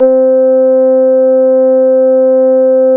SLAP C5, Bass; E-MU Producer Series Vol. 6 – Analogia Project (CD 1); Boom da Bass 1

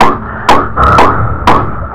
FXBEAT

